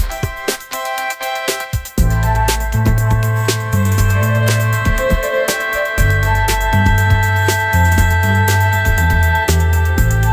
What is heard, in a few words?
bright motivation anthem